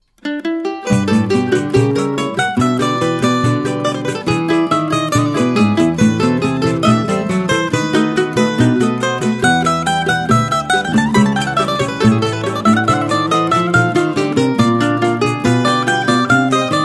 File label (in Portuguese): cavaquin